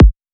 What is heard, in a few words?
clean kick